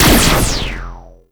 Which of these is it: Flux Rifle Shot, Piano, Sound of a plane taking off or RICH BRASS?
Flux Rifle Shot